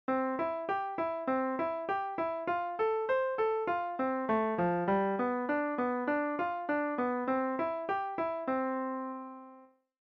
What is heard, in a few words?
arpeggio